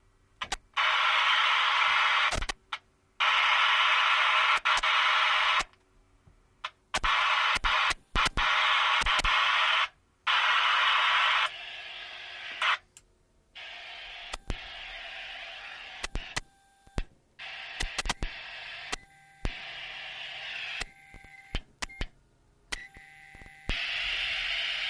Futz Radio: Squelches, Switches, Static Various. Dual